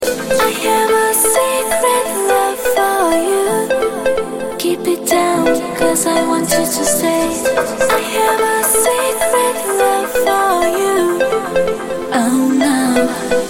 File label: secret love tone; Love Tones Mp3 Ringtones